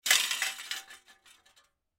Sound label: Metal Object Down, Metal Object Set Down Wobble; Wobbles; Foley; Blastwave